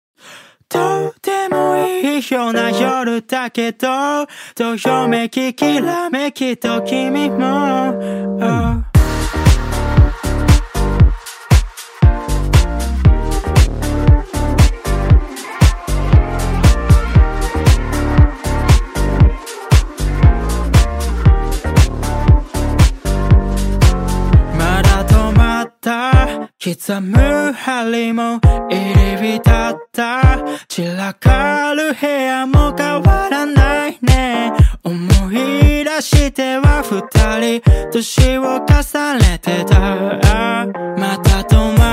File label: 117BPM, dance